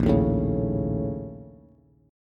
A sharp Minor Major 7th Double Flat 5th A sharp, Bass; strummed; 6,6,7,6; JGuitar